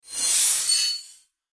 Sci Fi Transform Evaporation Disintegration; Blastwave

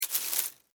Insect, Dig, Foliage, Leaves, Bug, Movement; Sound Effects:Animals:Insects; Miscellaneous Insect; Blastwave

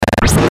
Glitch Click Descend; Glitch Sound Effects; Production Element Imaging Element Accent Transition

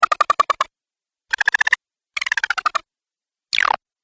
Clicky Ripple, click; Click Sound Effects

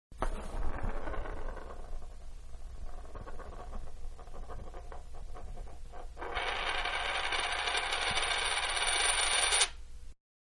Quarter Spun On Wood Table, Coin; Money; Objects

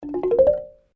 GAMALAN BAMBOO STRUM UP, Indonesian Gamalan copy; Hits (Sound Effects:Musical)

